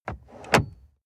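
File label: sports car interior glove compartment open soft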